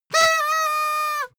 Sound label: paper hoot loud